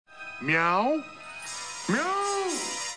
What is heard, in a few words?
meow